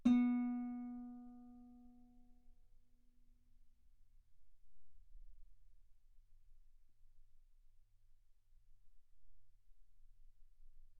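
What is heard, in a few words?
Guitar ff B string B3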